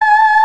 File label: VOICE & BELL